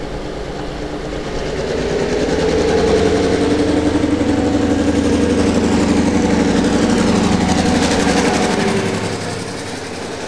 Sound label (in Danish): Tog koerer forbi; marklin